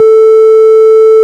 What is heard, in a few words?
Farfisa; VIP Cl 4' A4; Farfisa Clar DBar